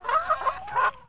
babies error; Desktop Themes 2000; Tiere

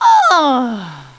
sigh; The Pea; SoftKey

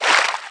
splish; DarkOmen

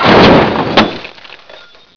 bang

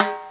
pan; My Science Fair

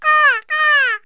bird; Wired for Sound Pro CD; Aristosoft, Inc.

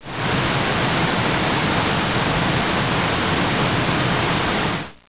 rushing water

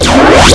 Flask Gone

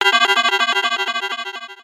pan alarm; Computer Buyer 1997 April

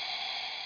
Lift

